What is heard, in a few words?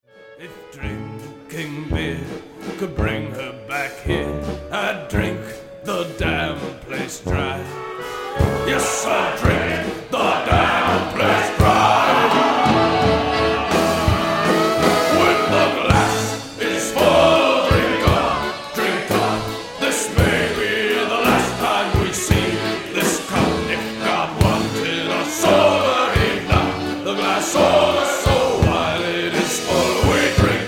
Drinking Song